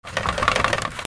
FRICTION

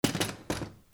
SQUEAKS

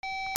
PHONES

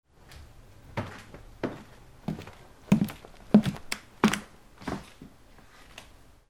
FOOTFALL

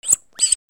TOYS

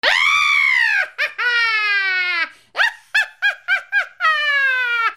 PEOPLE

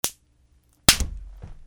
FALLING

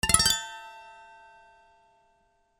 MUSICAL

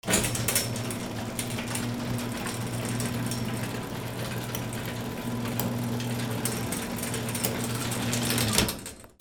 GARAGE

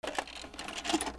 HOUSE

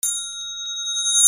BELLS

